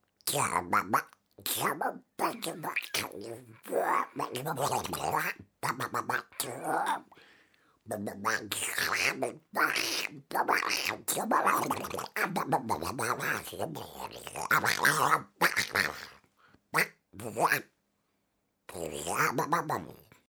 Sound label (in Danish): And